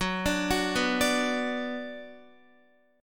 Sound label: Gb Suspended 4th Sharp 5th Gb, down, Guitar; arpeggiated